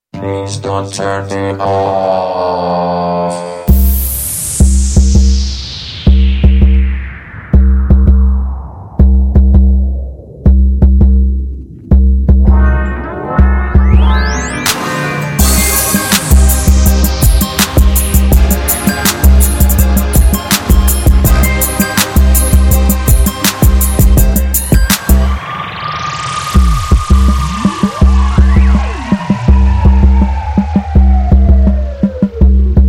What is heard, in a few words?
Instrumental 90